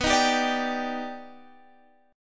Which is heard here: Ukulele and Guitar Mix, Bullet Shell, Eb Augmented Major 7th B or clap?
Eb Augmented Major 7th B